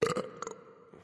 burp; Smite; bacchus

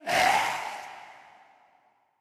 fire; smite; hades

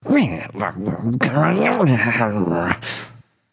grumble; smite; loki

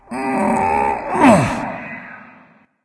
grunt lift; smite; voicepack; khepri

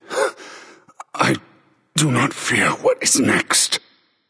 death; smite; hades

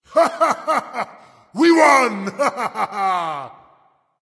nv yay; smite; voicepack; ymir

